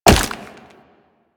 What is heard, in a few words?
Wall Jump Ice; Footsteps; Warframe; Tenno; Wall Run